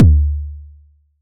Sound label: Toms Medium